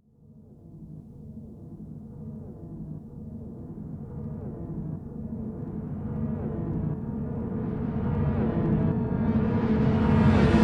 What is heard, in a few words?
Horn